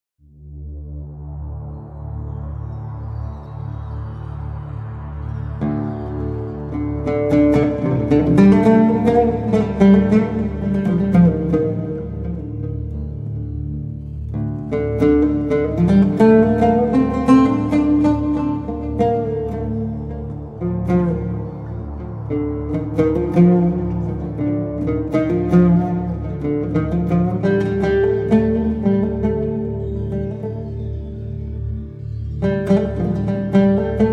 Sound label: Oriental Ambient